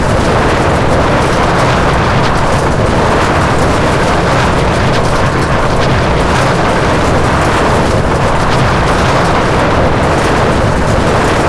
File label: road stones, Tires; AOS RF1 Kart Demo